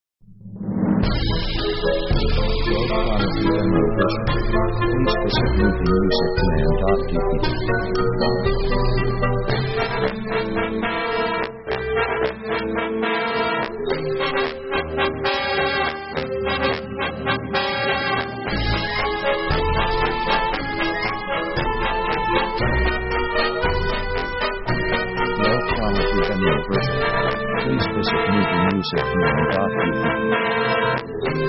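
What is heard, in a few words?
orchestra quirky comedy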